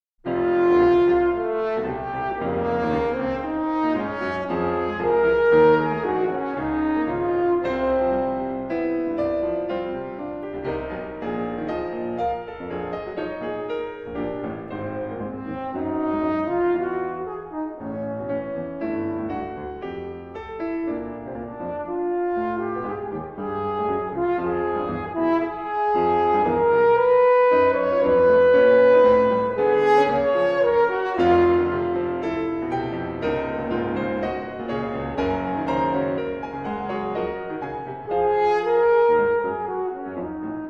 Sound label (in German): Sonate fur Horn und Klavier